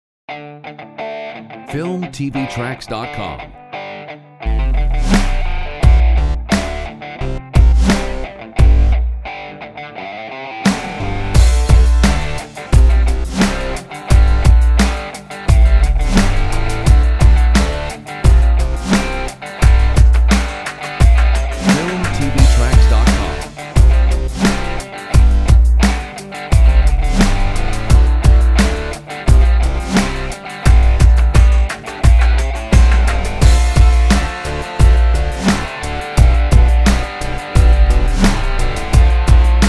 Cool Energetic Rock

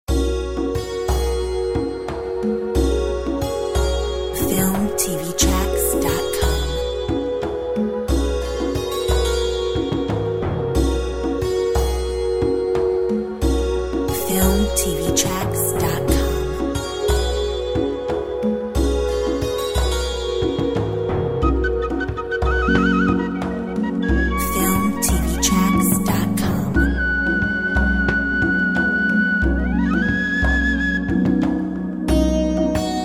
Asian garden

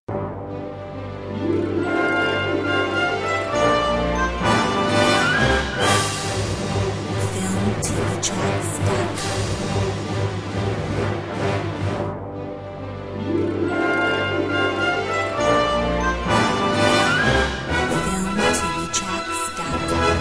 Western film

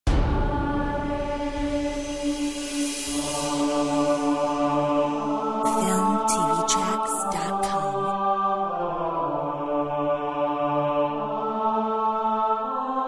Spiritual choir

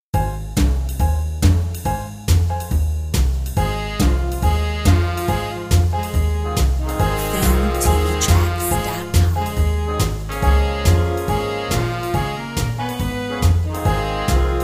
Moody swing Banjo

